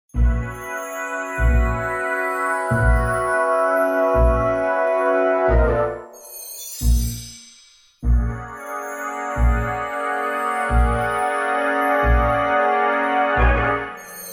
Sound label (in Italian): sigla; arte tv